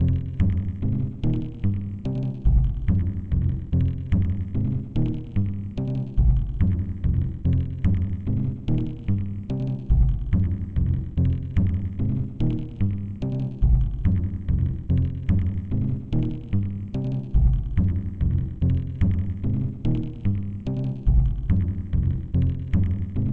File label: Music slow